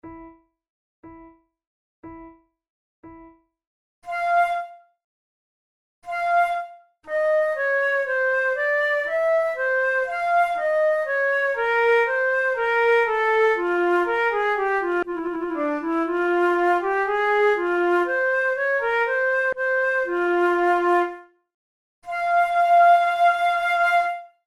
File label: prelude, flute